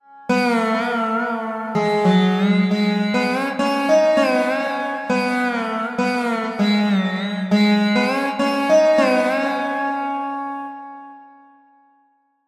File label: veena